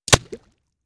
Bullet Impacts Water

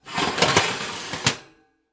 Oven Rack Push; appliances

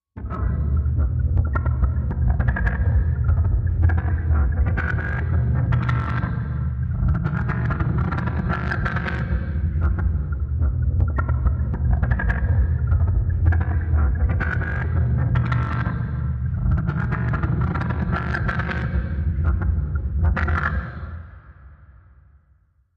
Cave Machine Low, static; Crows

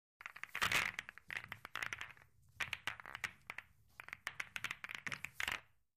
Billiards Racking B

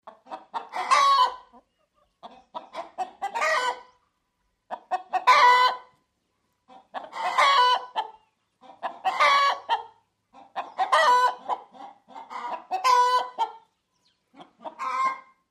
2 Hens Cackling; 2 Hens Cackling After; chickens

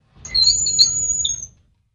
Drawer Metal Open

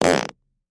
Close Up Fart

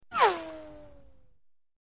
Right To Left Tonal, bullet bys

